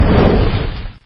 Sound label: attack